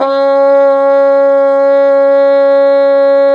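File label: brass plunger trumpet, plunger mute; Plunger & Wa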